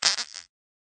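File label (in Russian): слизистый пердеж; slizistij perdet szhimaet perdet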